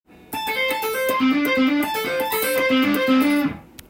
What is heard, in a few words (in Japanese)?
kantan taping; easy tapping phrases tab